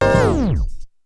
MUSC STP; BEAT2000

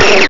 phasers

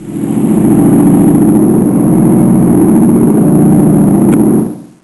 aircraft, hellc; warbirds

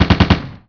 FLING

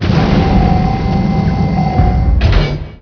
hangar

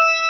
bink

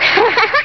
laugh, human

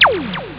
checkpoint; robossy

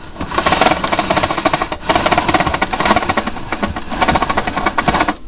PULLING

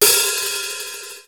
OPEN HAT; BEAT2000

